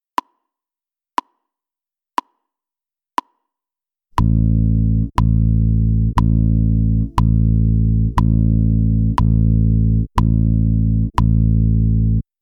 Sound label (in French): Noire 4-4